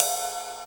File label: ride cymbal f key, Royality free ride single shot tuned to the F note. Loudest frequency: 6820Hz